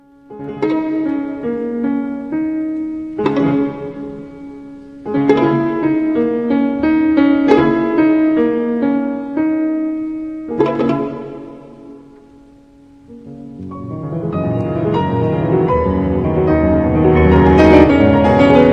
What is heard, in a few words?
Sonata for violin & piano